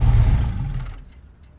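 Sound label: airflow off; Mafie 1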